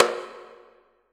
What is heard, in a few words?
SURDO; JV-1080 DRMS; EdgeSounds; Drum Mashines VOL-1